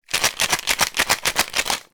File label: Shake a cocktail shaker